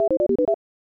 Unused Jingle; BS Satesupu DX 4; SNES

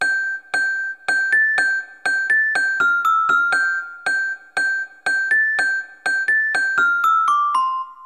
Celesta; Smeshariki Chiko's Computer

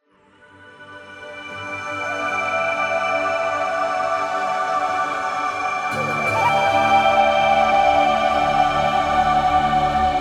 meditate; SAI REGROW

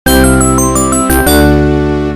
Target Reached

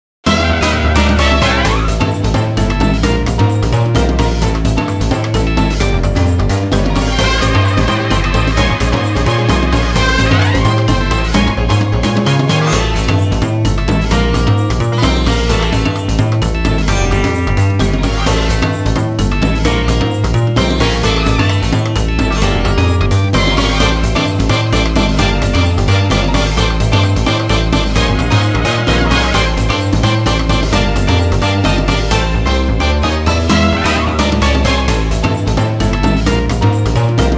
Midboss; new super marisa land original soundtrack 2010

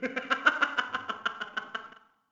Intro Laugh; Levels Hub Restaurant; Intro Laughter Adult

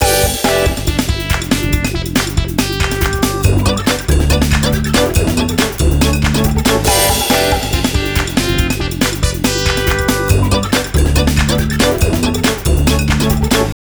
rule Bgm; Taiko no Tatsujin Doka to Oomori Nanadaime; PS2

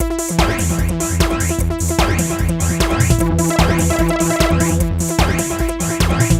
Electro

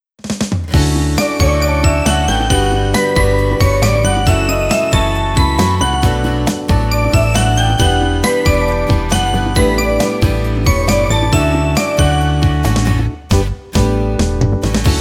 birdsong in a Sunny Spot; Fairchild GM Original Soundtrack

